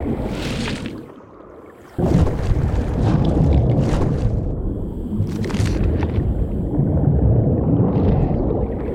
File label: Wing Ice Flow, ambience; Ninjago